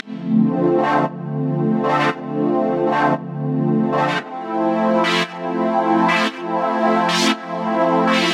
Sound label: 115 D Synth Clean Sweeps; Zero-G; Future Wave